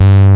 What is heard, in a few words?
BASS, Synthsonic; Pro-Rec - Synthsonic; BASSES